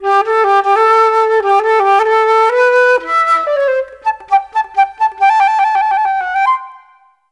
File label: Parl Music